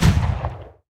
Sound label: mk108 real last shot right, guns